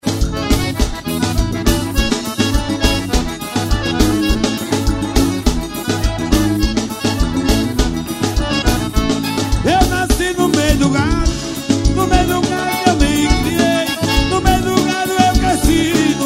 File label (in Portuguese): acordeon